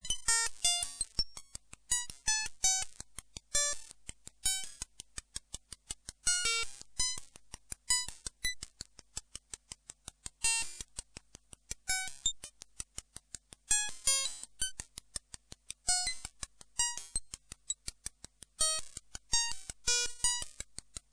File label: Freq